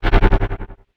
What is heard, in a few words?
quad countdown